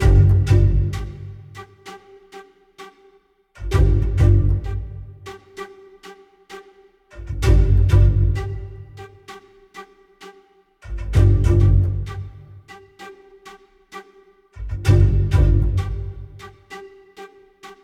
ambience Music; templeofnudges

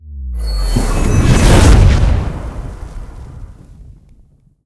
becoming Wild; parthenon

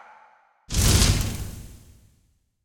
pick n Click fire

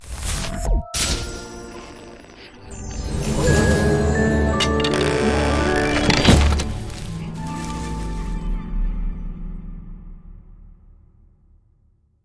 candy Win Reveal Low; fairyhansel

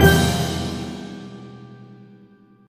big Win End; jack and beanstalk

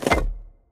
wild Land Stacked; fairyhansel

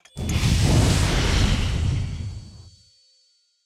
wild Move; wilderland

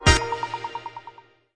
small Win; jewel fruits

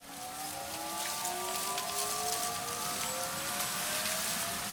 near Win; fruit shop megaways

